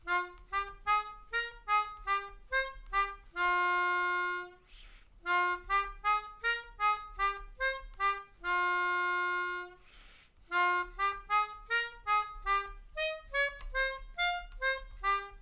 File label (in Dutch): Mijn Melodie, Melodica